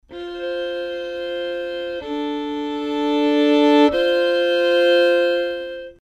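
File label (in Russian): скрипка звук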